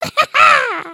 excited, emotes

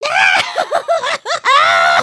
panic loop; emotes

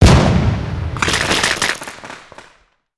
Cherry Bomb Impact

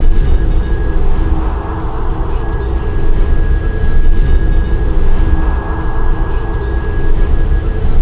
Crashed Ship

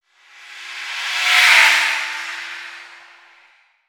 Car One shot Pitch Up

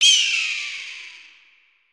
Hawk; gnarled forest

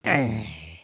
Idle Bobbing; Lego Strombie; creatures organic

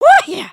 camera picture; emotes

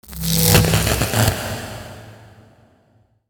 stone warrior spawn electro; creatures organic

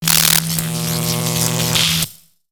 Robot Electro Arcs; creatures mechanical; Lego

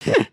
drink

